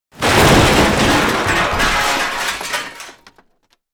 Crash; zoomerang

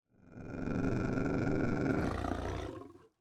jaguar growl; incredible-race

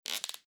floor creak; time-lab

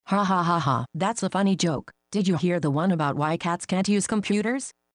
computer funny joke; time-lab

